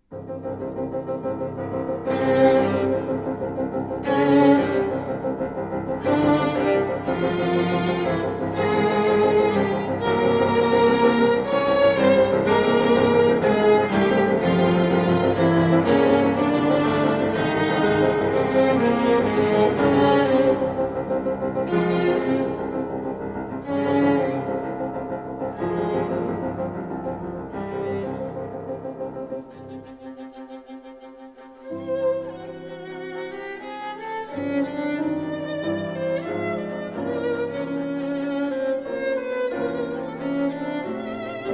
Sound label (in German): klavierquintett